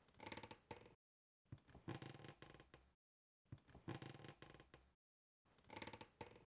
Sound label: Creaky Bed